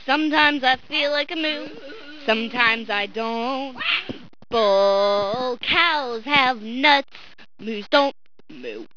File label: moo